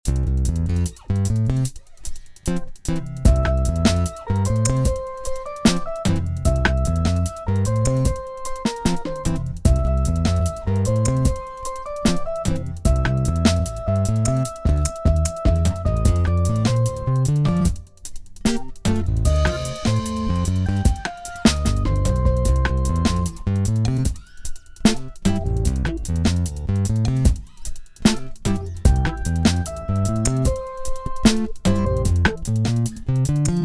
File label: 150bpm